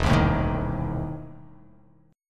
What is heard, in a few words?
E Major 7th Suspended 4th Sharp 5th E down; strummed; JGuitar